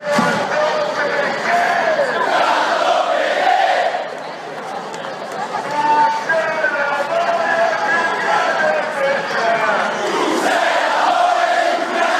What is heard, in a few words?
best pisa chants